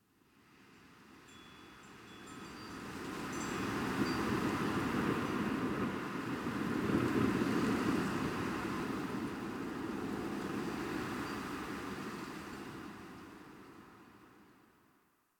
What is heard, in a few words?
Exterior Winter Wind One Off; Ambience; Warframe; NineteenNinetyNine